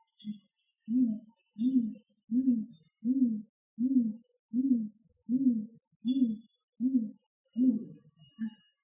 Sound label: GGOW male drumming